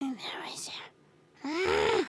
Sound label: indignant, emotes